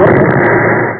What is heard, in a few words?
boom; Zombies; Amiga 8-bit Sampled Voice